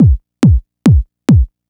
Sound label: Kick, Trance, Drums; Sonic Foundry (Sony Creative Software); Trance NRG VOL-1; Kicks